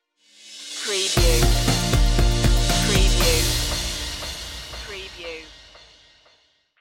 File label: northern lights stinger